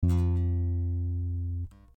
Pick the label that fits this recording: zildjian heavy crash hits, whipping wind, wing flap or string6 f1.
string6 f1